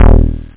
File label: moog